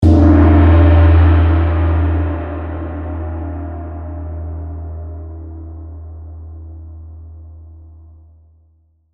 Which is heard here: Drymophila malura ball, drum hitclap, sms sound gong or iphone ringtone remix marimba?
sms sound gong